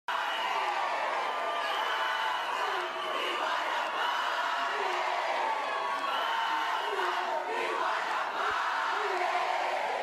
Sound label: Amapiano Mix